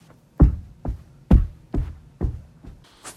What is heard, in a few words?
Walking On Carpet